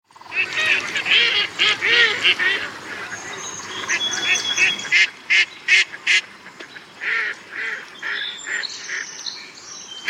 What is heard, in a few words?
mallard duck